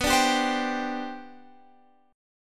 C Major 7th Suspended 2nd B, chord

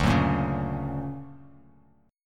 D Augmented Major 7th down Bass Standard; D+M7 strummed; JGuitar

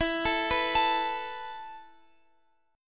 E Suspended 4th E, down, Guitar, Open F; arpeggiated; Esus4

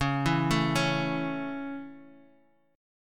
Db Minor Sharp 5th Db down Guitar Half Step Down

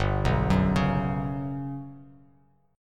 G Major Sharp 11th G, Bass Standard; GM#11 arpeggiated

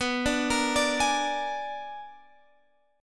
B-Minor Major 13th, Guitar Open G

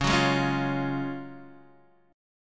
Gb Major 7th Suspended 2nd

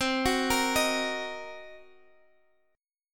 C Minor 7th Flat 5th C down Guitar Open F; arpeggiated; Cm7b5

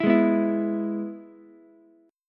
G sharp Minor Sharp 5th, Ukulele; G#m#5 strummed; JGuitar

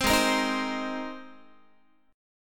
G sharp Major Add 9th, Guitar; strummed; 15,16,13,13,16,x; 4A,4E,5A,5D,5G#,6C#; G#add9

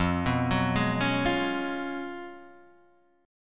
Db 11th F, guitar

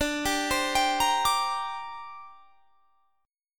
D 7th Suspended 4th Sharp 5th down, chord; D7sus4#5; arpeggiated

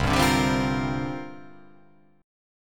C 9th Suspended 4th C down

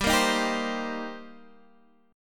D sharp 6th, G

